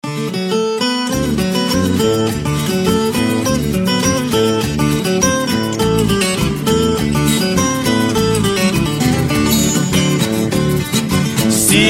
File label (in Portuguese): pagode de viola